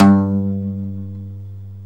OUD G 2

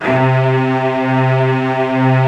HALL STRINGS, EMU; Rubber Chicken Software Sample Bank

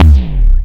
Cwejman S1 Static Cling Kick

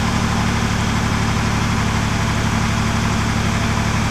DIESEL ENG, Diesel Motor; SFX

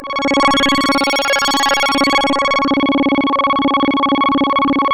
C#, Synclav FM; Big Fish Audio; SPACE1

